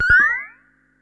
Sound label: Perc Synth Moog Delay; sci-fi